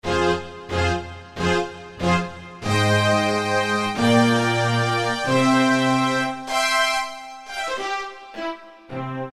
Bouncing Bows, Roland; Roland PN-D50-01

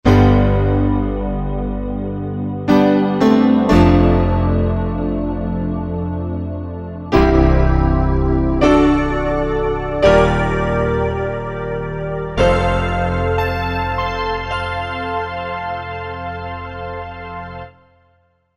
Piano Pad, Korg Wavestation A-D

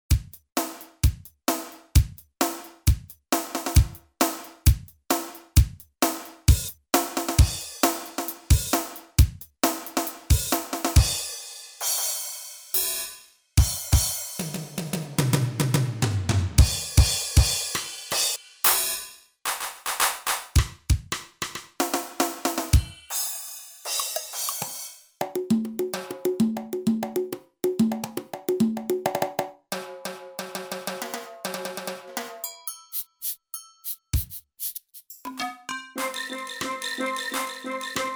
Rhythm, Roland JV-880; Factory Patches; Preset B Rhythm demo

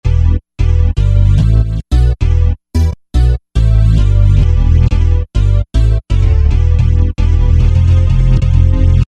hit; E-mu Orbit V2

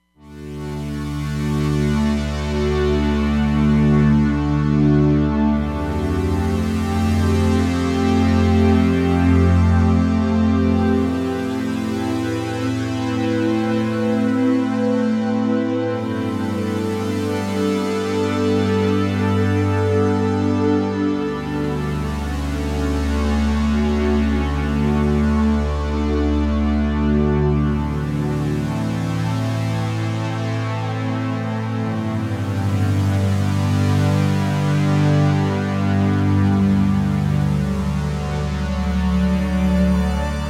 Electric Pad, Roland Fantom XR

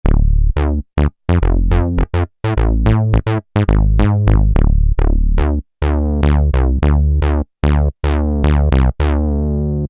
Saw Bass, Kurzweil K2000; Kurzweil K2000 Value Pack; Extra Programs

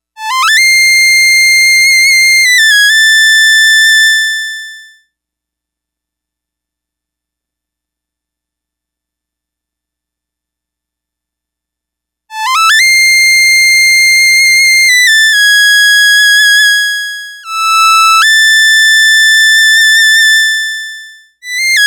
VIOLIN

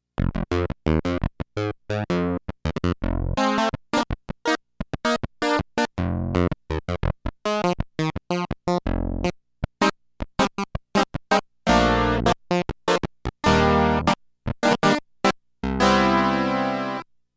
Clavinet

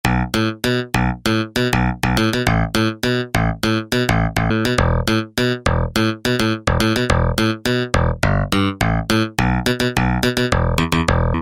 FM BASS; Akai SG01v

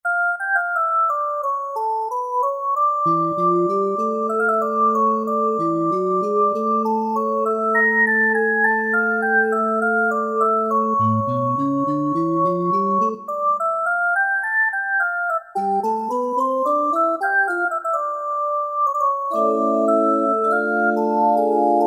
French New Organ, Roland JD-990; Factory Patches

